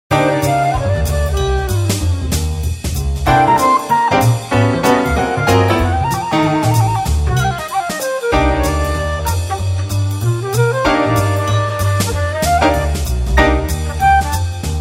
JASS FLUTE

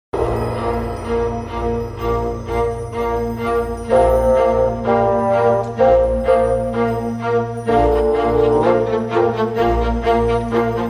CLASSICAL DANCE

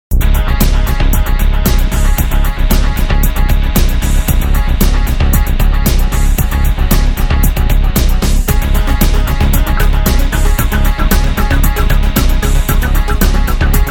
ELECTRO

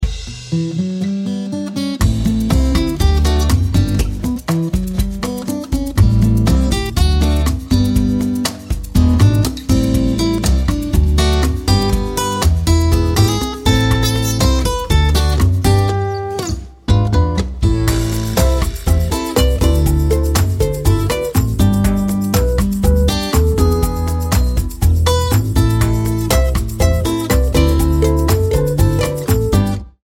cha cha guitar

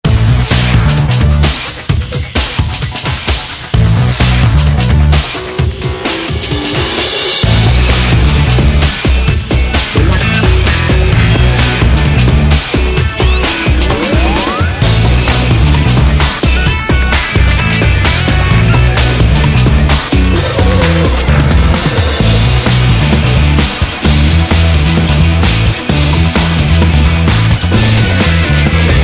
BIG RIFF

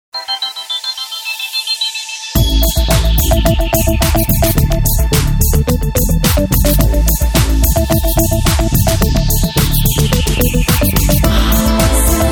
TRANCE; PARIS

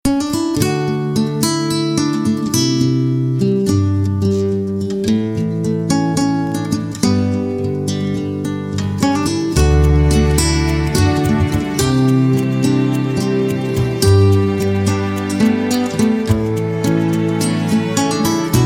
ACOUSTICA

